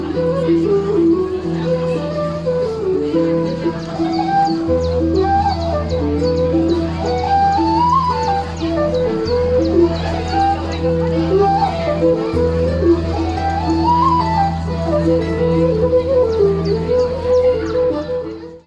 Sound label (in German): Harfinistin und Floete